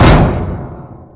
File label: ap hit